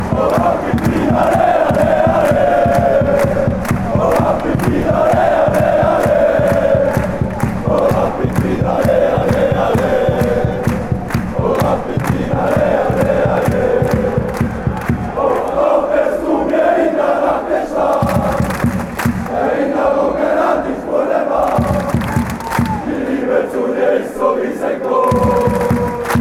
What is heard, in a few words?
oh rapid wien alle alle alle